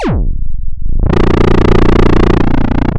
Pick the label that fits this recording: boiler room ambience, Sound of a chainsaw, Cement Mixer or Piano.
Sound of a chainsaw